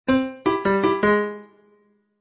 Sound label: PIANO COMEDY